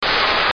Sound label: hose; garrysmod